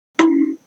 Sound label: bonk